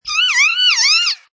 Kea call, Exotic bird - New Zealand Kea calling. Modulating, undulating sound bobbing up and down; Birds; Animals